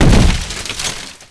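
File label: hit stone; WhiteOut; XBOX; iceberg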